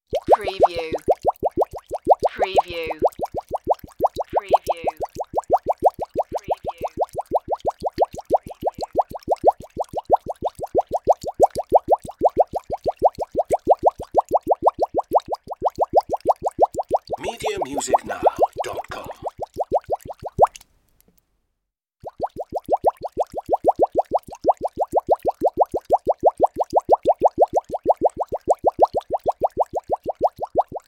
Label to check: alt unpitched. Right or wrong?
Wrong